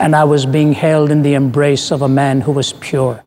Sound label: Vocal Phrases; Vengeance Samples; Vocals